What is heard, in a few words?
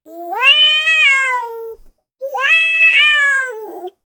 kittens playful meows; domestic animal; domestic animals